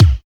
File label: HAZE KICK; Drums In Your FACE!